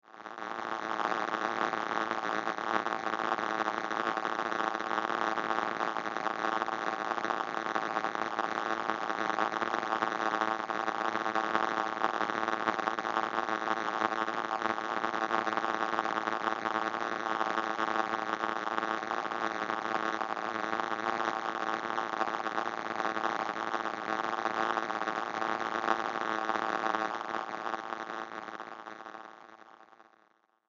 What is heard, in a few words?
Science Fiction Electric Arcs Constant; Electrical; Sound Design